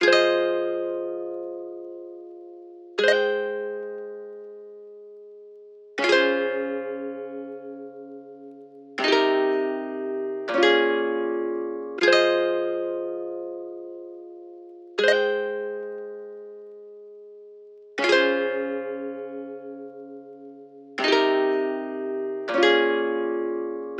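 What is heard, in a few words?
Guitar Nylon, F minor, 80 BPM, Dry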